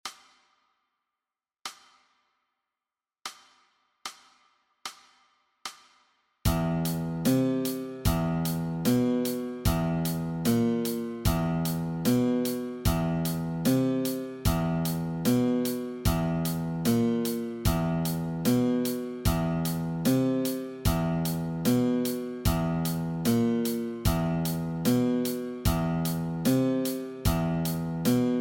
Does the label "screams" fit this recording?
No